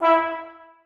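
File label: trombones staccato e4; Sonatina Symphonic Orchestra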